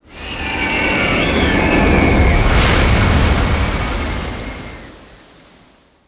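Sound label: distant log ride splashdown; fun park; Tony Hawk's Project 8; XBOX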